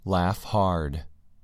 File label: laugh hard